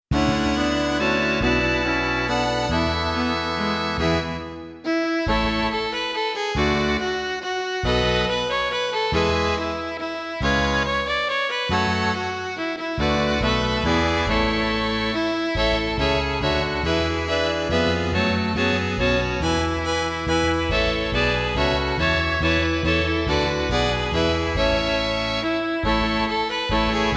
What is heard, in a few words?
We Wish You a Merry Christmas, Viola